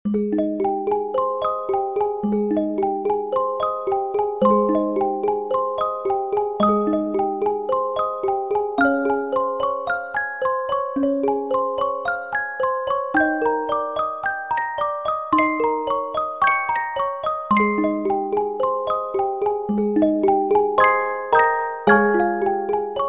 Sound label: Handbell Choir; Handbell piece composed during a trip to Denver, Colo